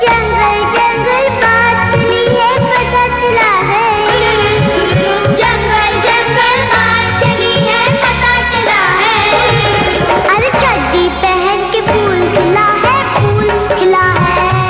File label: Jungle Book; TV Serial Tone